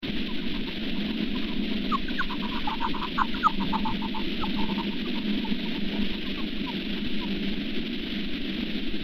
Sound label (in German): breitflügelfledermaus; Fledermäuse - Rufe transponiert; Mag. Peter Holub